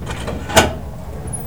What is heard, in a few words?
Door Open; Inner Demons